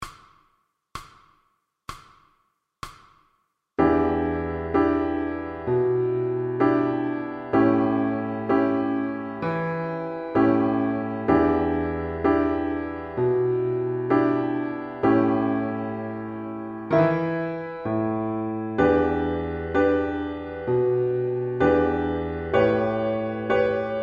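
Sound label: VLN, piano accompaniments for violin; MTB Pre- Grade Introductory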